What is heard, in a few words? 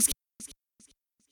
voice